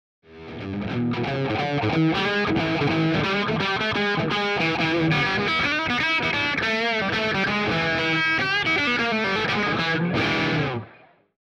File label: Strat Blade Rock, guitar